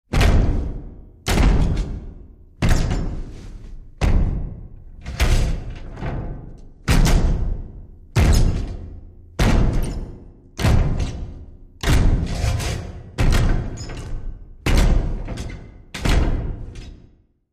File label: Monster Footstep, monsters